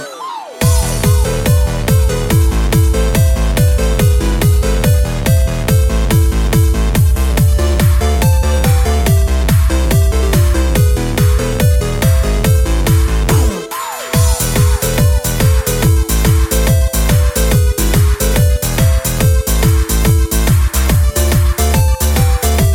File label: Dance Remix; Tetris